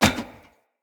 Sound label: Heavy Grunt Boot Walk Metal, Footsteps; Enemy; Sinecure